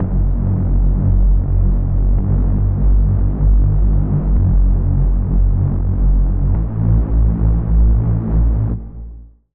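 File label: Reese, Bass; Reese - Dark Side of Humanity; Sylenth1; Routed Sound; Sylenth1 Expansion - Noble Gangster Vol.1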